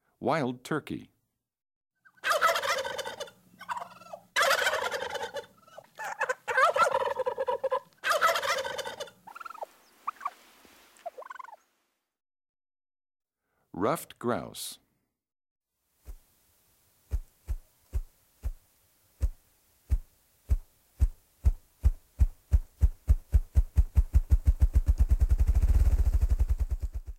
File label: Wild Turkey, Ruffed Grouse, Ring-necked Pheasant, Spruce Grouse, Northern Bobwhite; Eastern-Central